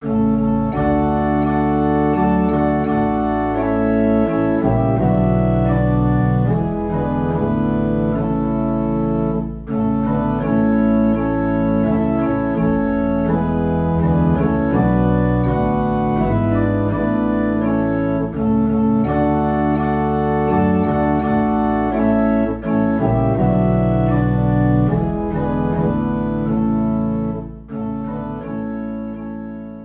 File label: Organ Low in A; Children of the Heavenly Father